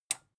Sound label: metal button tiny release enable